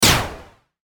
LASER BURST; MULTIMEDIA; Blastwave

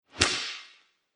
Hit Sharp Crack, metal; Impact; Andrew Fleming

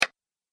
Game Piece Place, set down; Set Down Sound Effects; object sound effects

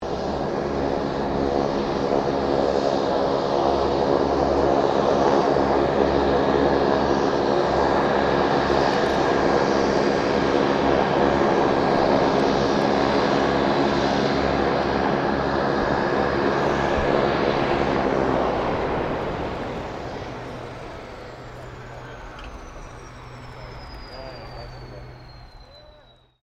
King Air 350 shutdown; Airplanes; Vehicles

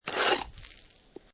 Snow And Ice Ice Skate Slide With Ice Particles

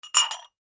household glass ramekins x2 clink; Clinks; Objects; McKinney Sound

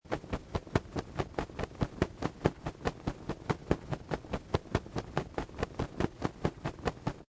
Wings Flapping Bird; Bird Sound Effects; Animals; Lynne Music

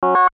chime blip chords; Multimedia; Chimes; Blastwave

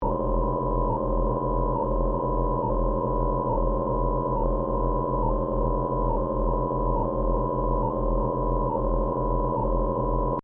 Alarm Loop; Alarms (Sound Effects:Electronics)

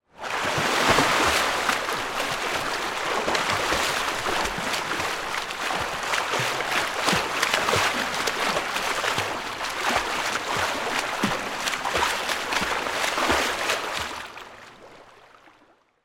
Pool Indoor Swim, Water, Splash; Pools; BlastWave

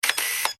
technology camera lens auto focus nikon f4; Cameras; Electronics; McKinney Sound

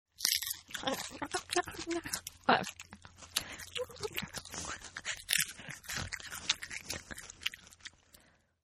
Eating Noisy Female; Human

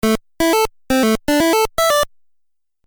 Musical Phrase Video Game

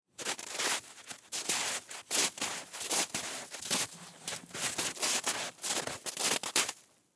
Snow Dig In Snow With Hands; Ice; Weather; Ice Sound Effects; Blastwave

